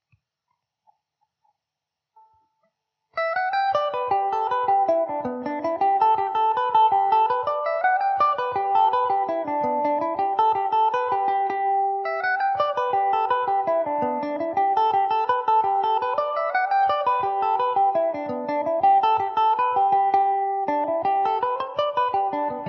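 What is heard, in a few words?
jig G major mandobird, irish mandolin; Joe Crilly’s Jig; Epiphone Mandobird VIII electric mandolin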